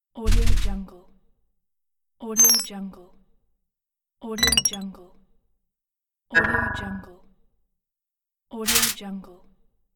selectors web site elements; livingroomclassic

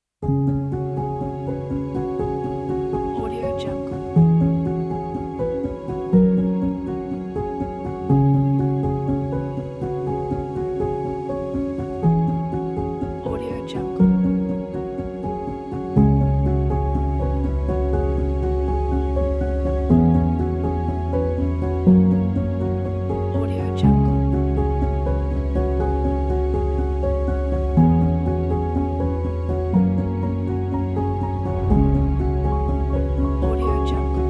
documentary background music

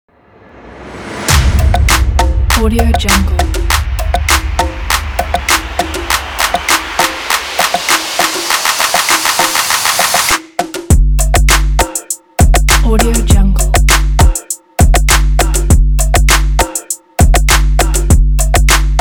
hip hop sport

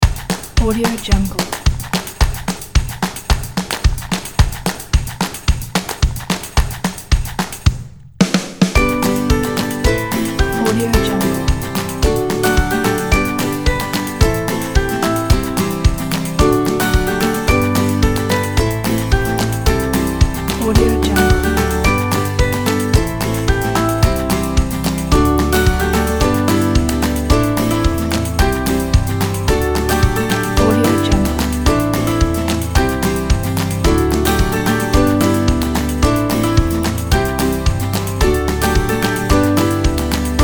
folk happy background pack

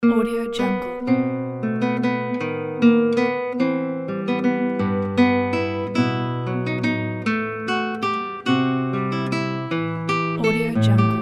russian romance, classical guitar; manro classical guitar

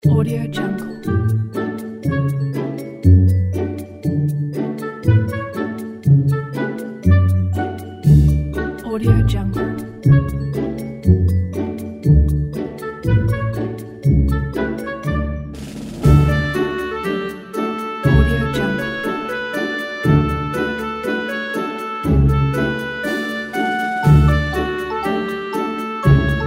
spring mood soundtrack

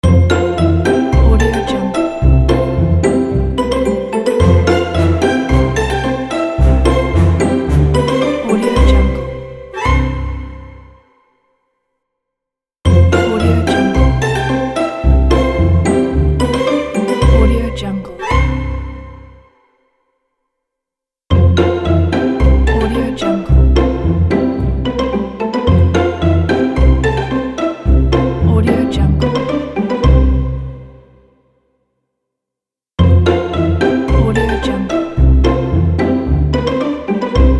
funny kids show opener

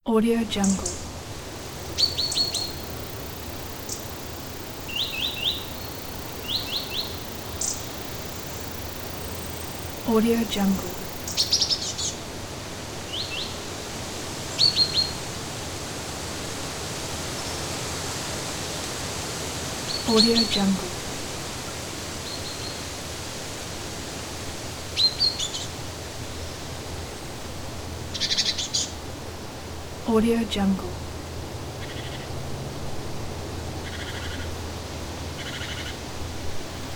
forest ambience birds and soft wind; soundjay

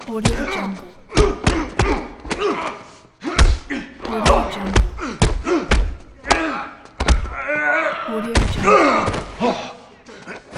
man fight

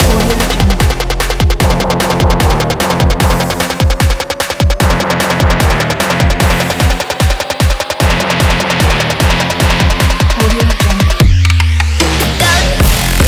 dubstep

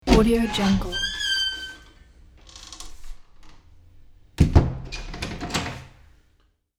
squeaky door open and close

